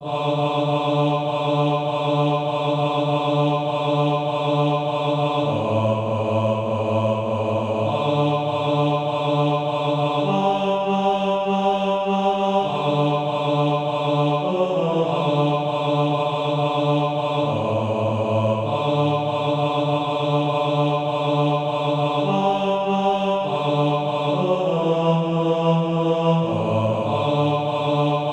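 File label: bass, Practice Music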